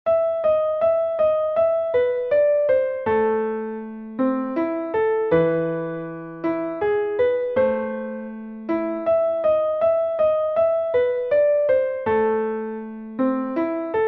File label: Easy Piano